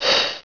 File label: Brake, Train; OpenBVE